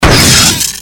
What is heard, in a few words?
evil die; CAC ASSETS